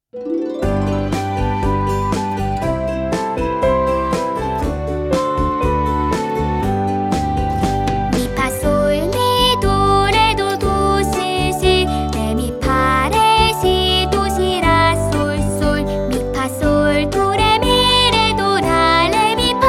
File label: scale